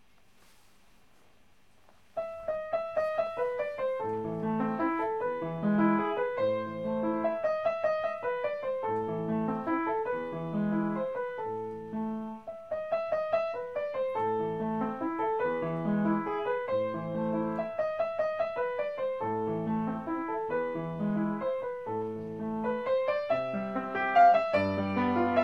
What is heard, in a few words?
piano; fur elise